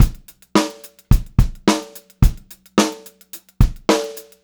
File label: POP BEAT, Pop-Rock Drumloops; 108 POP